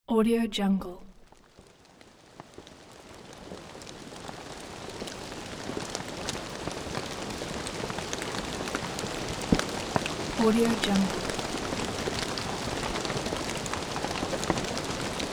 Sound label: city rain